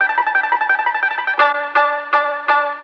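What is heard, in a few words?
yueqin toot; Musical Instruments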